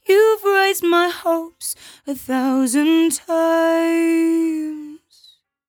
57bpm Am, Velvet Vocal; Zero-G

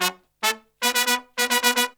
Pop & Funk Brass, R

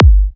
G, Kicks; markus hakala

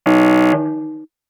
Percs, Elektron MachineDrum SPS1 MKII

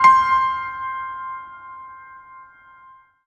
Piano Hit OS; Sonic Foundry; Rondo Brothers Trip-Hop Loops & Samples; Apologists

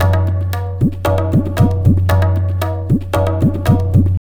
tabla, 115 bpm; Zero-G; Pure Tabla

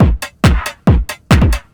drum 138, Techno; drum-loops

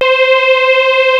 C 605 CHOR, Yamaha C605; TECHNO-BWARE

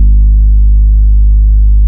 TAURUS A2, synthesizer

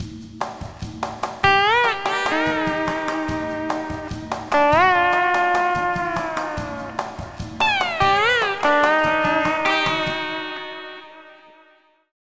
Dark Full D#, Lounge; Zero-G Kocktail Kollection; Construction Kitz

